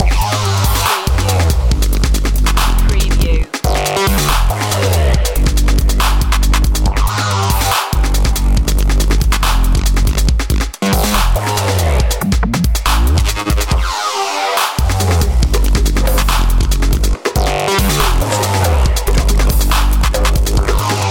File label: step 2 edge loop